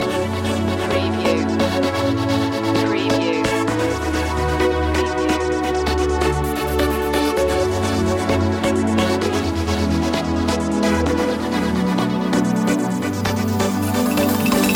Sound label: up all night loop